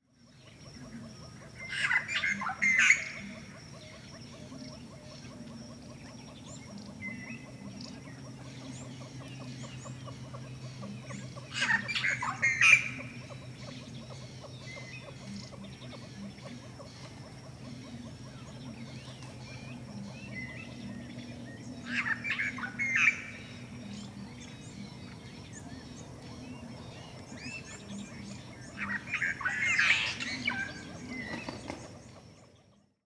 óriás fényseregély hangja

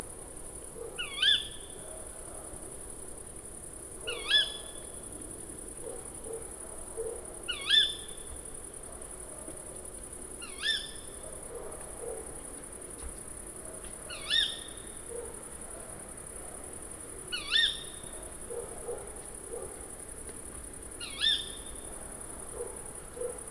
macskabagoly hangja

